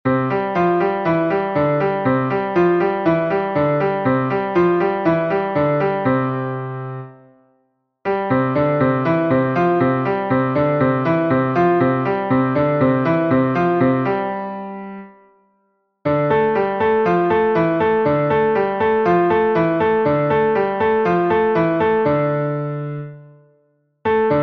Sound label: Piano Strong Hand Alt Thumbs; Warm-Ups